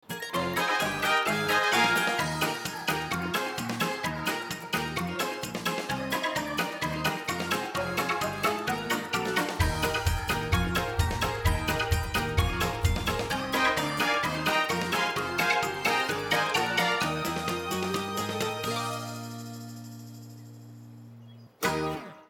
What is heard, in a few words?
Welcome Song Instrumental